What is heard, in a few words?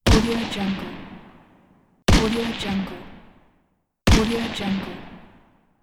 m4 shots